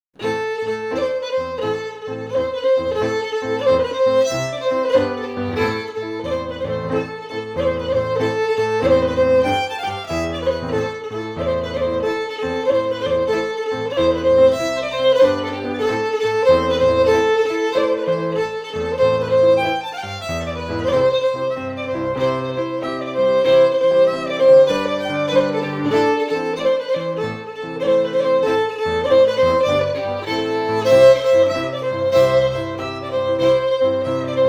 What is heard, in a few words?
FIDDLE PIANO; Jink & Diddle Book o' Tunes 2022